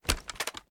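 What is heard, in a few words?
inspect, shotgun; gmod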